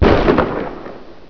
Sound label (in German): KNALLER